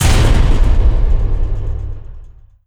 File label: railgun hit; weapons; Stellaris